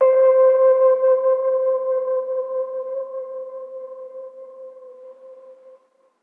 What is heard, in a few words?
Tape Vibe One Shot - C; Cymatics; PHARAOH Beta Pack; Pharaoh Premium Drum Samples (BETA)